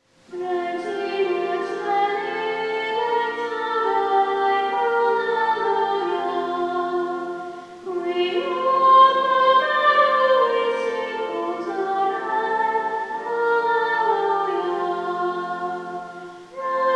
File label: regina caeli; CHABANEL PSALM TOME; victoria